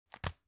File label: tissue package drop soft